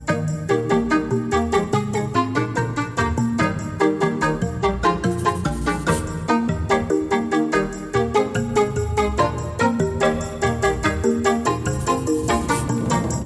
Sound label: Tune; Kameo